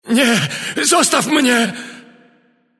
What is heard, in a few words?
river; God of War Collection Volume 2; PS3